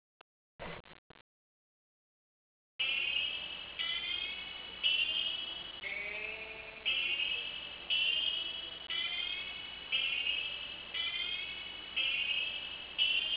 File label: bigben